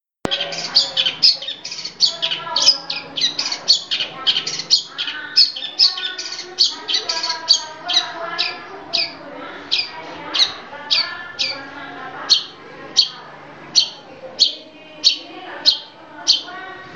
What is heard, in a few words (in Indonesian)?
suara cendet betina